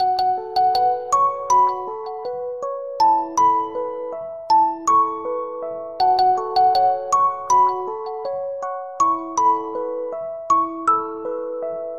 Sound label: Footstep, ringtones; IUNI; IUNI OS 3